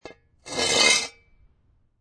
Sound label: Shovel Single Scrape Concrete, Foley, Shovel, Metal Shovel, Single Scrape On Concrete